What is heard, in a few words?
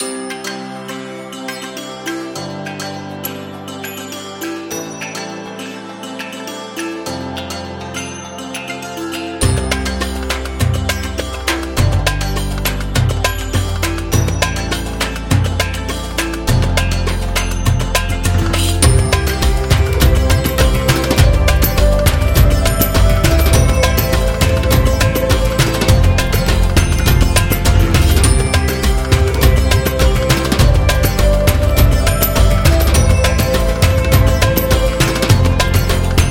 Indian Background Music